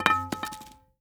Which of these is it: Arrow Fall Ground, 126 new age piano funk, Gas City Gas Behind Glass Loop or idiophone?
Arrow Fall Ground